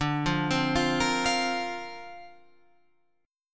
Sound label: D-Minor 7th Sharp 5th D Guitar Open F, chord; Dm7#5; arpeggiated